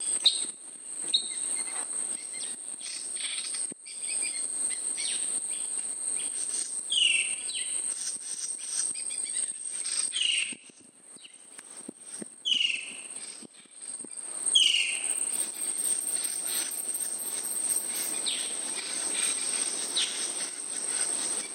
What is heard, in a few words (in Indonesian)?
suara burung cekakak cina